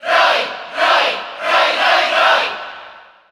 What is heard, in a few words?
Cheer Spanish; Roy; SSBU